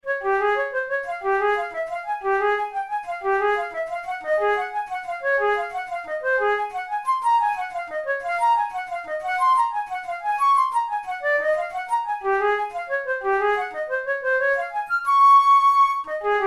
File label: methode pour la flute study; drouet